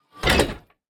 copper chest weathered close

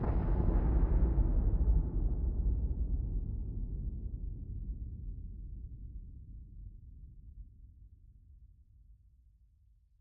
end flash, ambient weather; minecraft 1.21.10

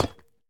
hit, copper statue

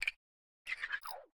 spin, copper golem weathered; Minecraft

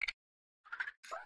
spin, copper golem, oxidized; Minecraft

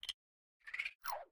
spin, coppergolem; Minecraft

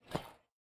step, copper golem, oxidized; Minecraft

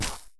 place, dried ghast

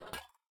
step, copper golem weathered; Minecraft

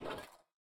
step, copper golem, oxidized; Minecraft

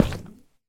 activate, shelf

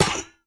hurt, coppergolem; Minecraft

